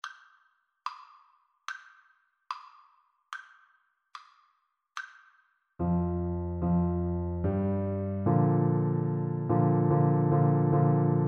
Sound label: to the river, Tuba